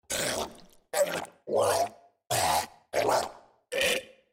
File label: choking creature